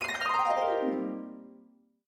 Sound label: Harp Glissando; House - Harp Glissando 1; Nintendo Labo; Toy-Con 01 Variety Kit